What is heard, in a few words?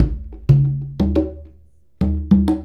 Congas; Essential Percussion